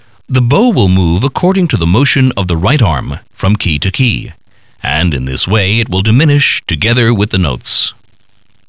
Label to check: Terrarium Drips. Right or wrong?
Wrong